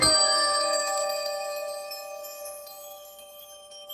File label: bell sparkle; sound effects